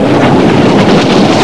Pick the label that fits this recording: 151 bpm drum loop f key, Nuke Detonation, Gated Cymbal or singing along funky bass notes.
Nuke Detonation